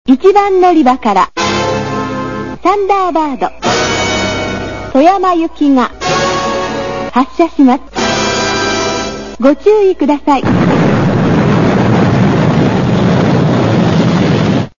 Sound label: thunderbird, trainsim